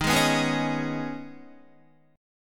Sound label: Eb 7th Suspended 4th Sharp 5th, Guitar; strummed; chord